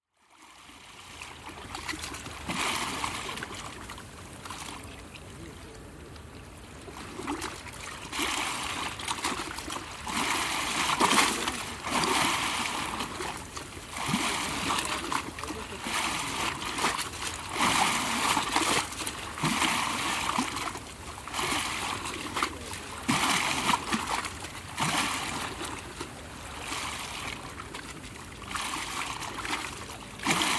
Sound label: Kotor bay Kotor city sound guide